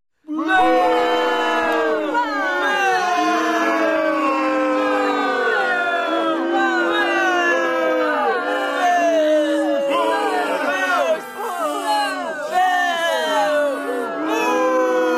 Booing Medium Sized Crowd Of Men And Women

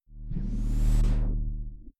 Cyber Whoosh

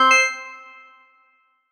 Electro Open Sound

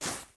Sand Paper Rub

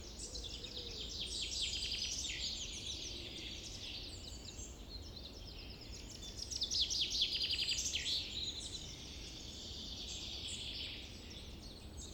Forest Summer

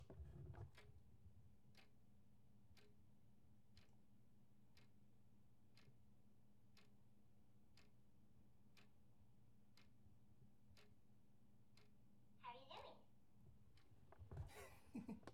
Clock Ticking

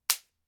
Face Slap

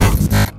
Robotic Transform

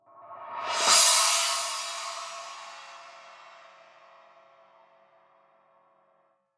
Cymbal Roll

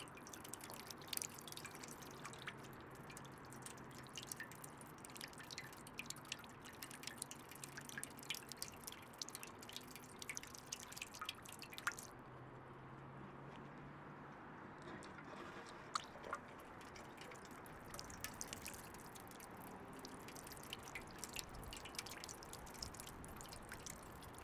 Drinking Water Fountain

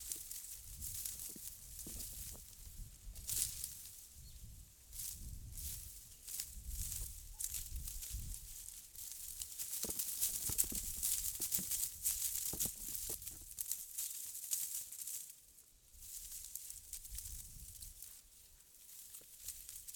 Rustling Bush

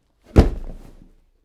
Bodyfall Heavy Single Thump Gym Mat

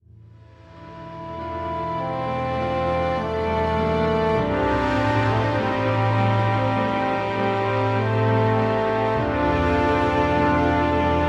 Orchestral Outro Theme

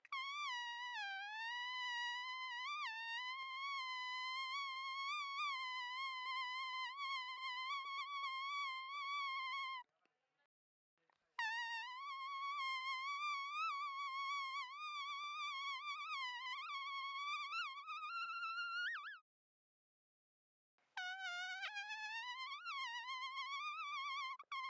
Mosquito

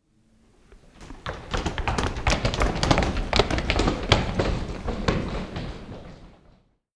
Run

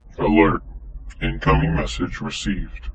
Alert Incoming Message Received

